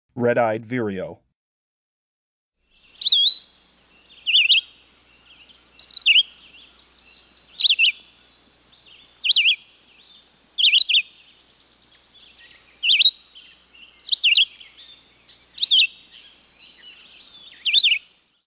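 Red-eyed Vireo, Breeding Birds of the Chicago Region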